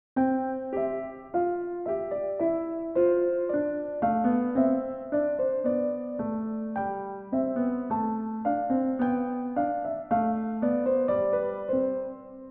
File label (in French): premier contrepoint